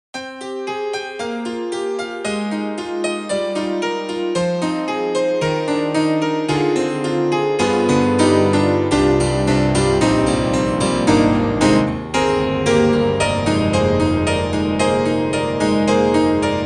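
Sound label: YAMAHA MOTIF-RACK XS Tacky, piano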